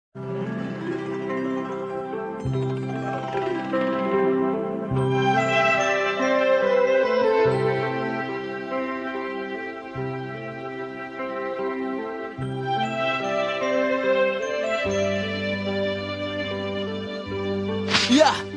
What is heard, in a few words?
soothing bgm